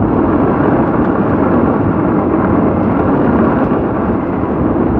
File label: Mud Surface; Totaled; XBOX